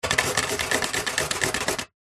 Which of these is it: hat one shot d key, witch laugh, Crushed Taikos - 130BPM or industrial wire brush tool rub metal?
industrial wire brush tool rub metal